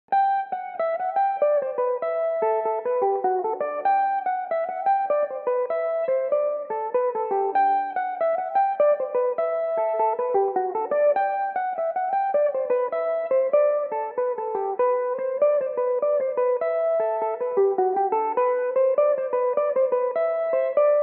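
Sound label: G major, Irish mandolin; lep up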